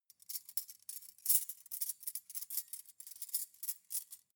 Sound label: cutlery cake forks handle long